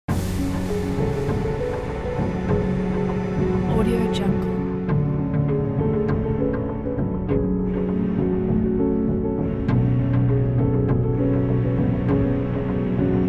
horror is mystery

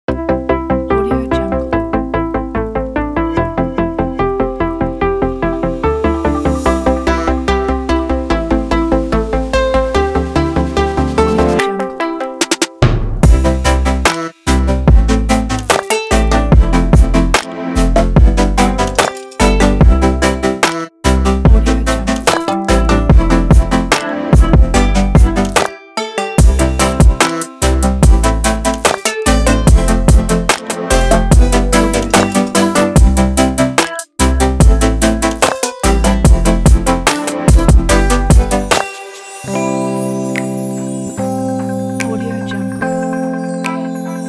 having fun future bass

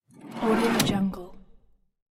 household drawer roller runner small open

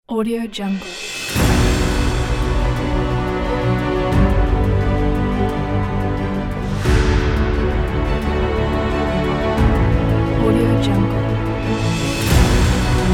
cinematic trailer

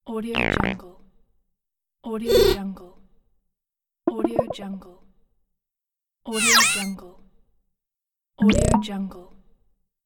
gaming sound fx

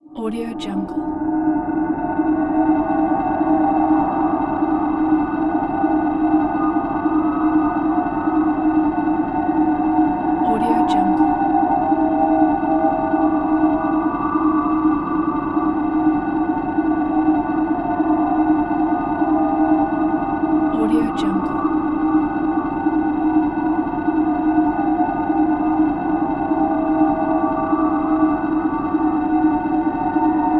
scifi background horror atmospheric

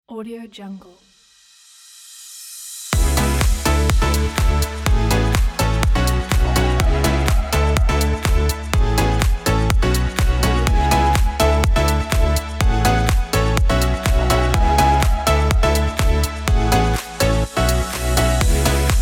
house

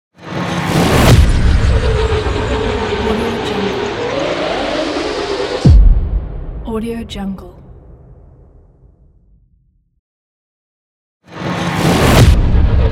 helicopter crash explosion

